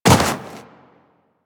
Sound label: Run Snow, Footsteps; Warframe; Tenno